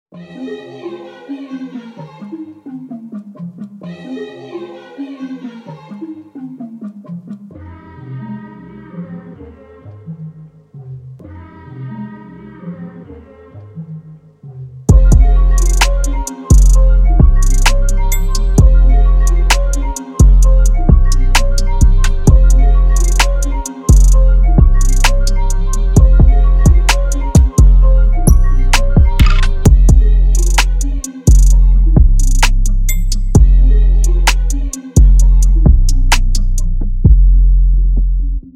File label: Instrumental